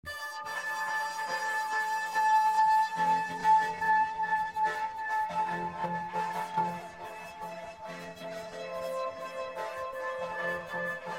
ambient drone; noisesurfer

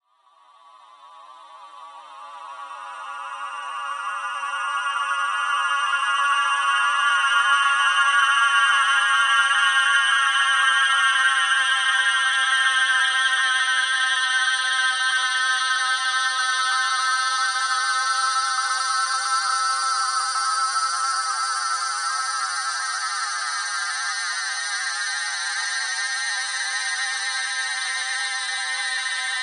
Sample Upper Shimmer; SoundChaser; CerebralAudio

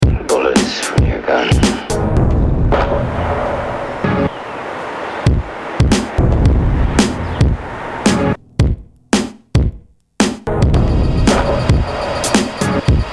bullet loop

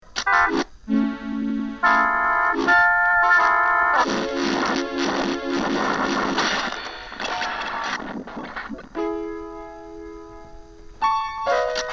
crane; Princess; Magic Keyboard

